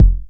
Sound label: 909 MID KIK SF